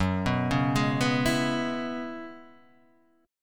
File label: F sharp 7th Suspended 2nd Suspended 4th, down